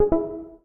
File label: button